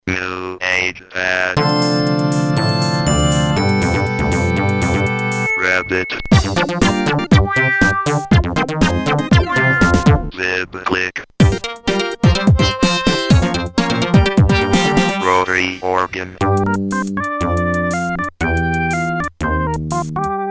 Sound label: Synth 4op